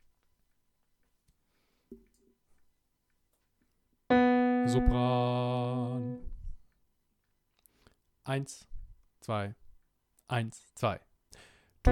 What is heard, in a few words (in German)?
Sopran allein; Thuma Mina